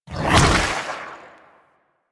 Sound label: Universe Ring Cast Burst; Warframe; Warframes; Nezha